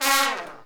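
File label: trumpets FX, Brass; Roland L-CDX-03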